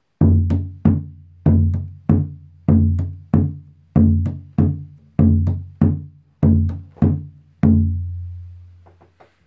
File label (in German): Duruma Trommel Kenia